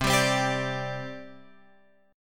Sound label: C Suspended 2nd, C, down, Guitar, Open F; Csus2 strummed